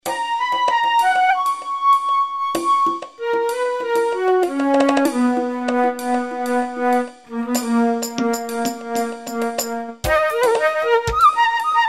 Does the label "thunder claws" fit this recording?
No